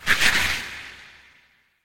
Dragon claw scratch; SyrinScape; Madness in the library

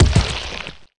sludge FALL; The Elder Scrolls III - Morrowind

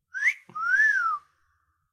Whistler bird

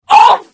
Hit; The Elder Scrolls III - Morrowind

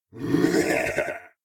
laughter; Eshimal; SyrinScape; Snarl and Oraculum

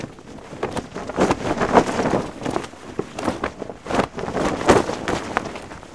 flag; The Elder Scrolls III - Morrowind; Morrowind GOTY GOG

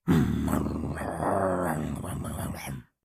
Dino growls; SyrinScape; Syrinscape unplugged; Jungleplanet dinolaser battle

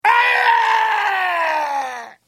Hit

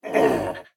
Combat; Eshimal; SyrinScape; Snarl and Oraculum

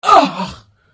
Hit; The Elder Scrolls III - Morrowind

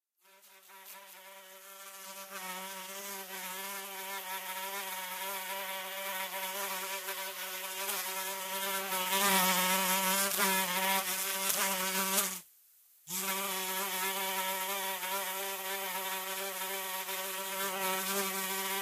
Fly buzzing; SyrinScape; Against the cult

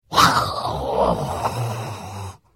Hit; The Elder Scrolls III - Morrowind

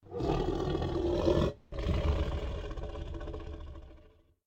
Tiger growl deeper; SyrinScape

